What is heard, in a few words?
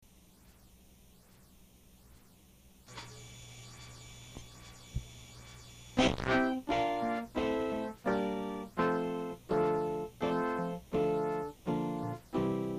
irish folk